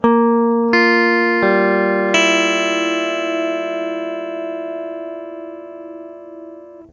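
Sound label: clean guitar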